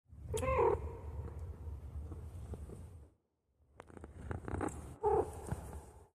kitty; The Silicone Town

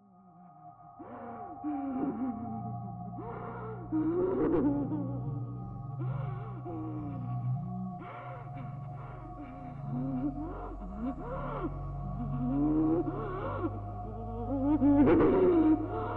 hallway; roomeyes; A tourist trap

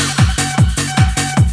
second wave; Rusting

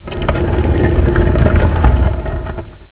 door

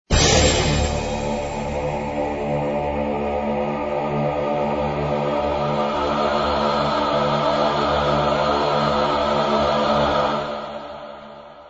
start, dungeon music

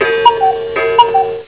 cuckoo; WorldsFair

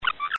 mouse; angelsNdogs; dungeon1

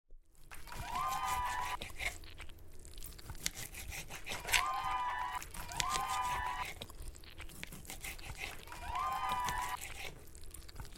crowd; The Silicone Town

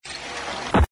head off; angelsNdogs; dungeon1

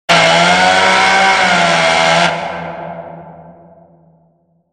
saw

